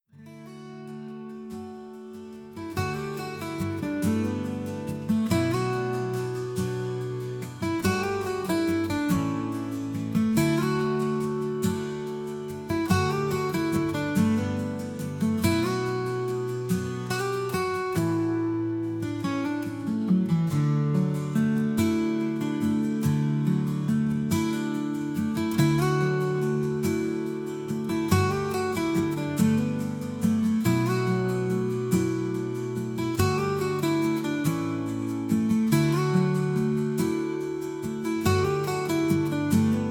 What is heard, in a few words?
Jazz Guitar, Acoustic Guitar